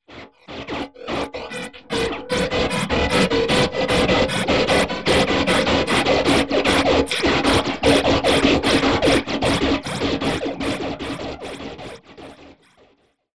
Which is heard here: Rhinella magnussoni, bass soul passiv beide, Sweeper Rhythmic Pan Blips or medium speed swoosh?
Sweeper Rhythmic Pan Blips